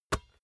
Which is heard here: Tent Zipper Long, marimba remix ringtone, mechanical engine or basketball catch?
basketball catch